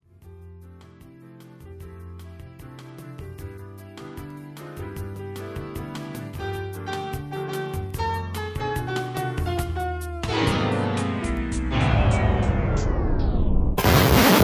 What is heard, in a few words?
Keytar; Godzilla